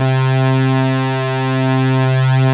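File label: PPG synth brass, PPG Wave 1; Greytsounds VOL-1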